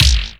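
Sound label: kick wiggle, dance kicks; Roland L-CD701; Dance K1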